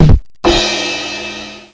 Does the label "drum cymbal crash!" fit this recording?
Yes